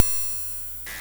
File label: cymb, cymbals